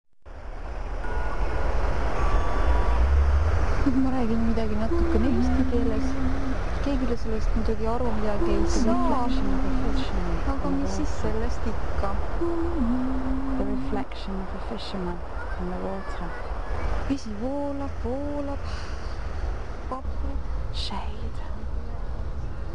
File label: water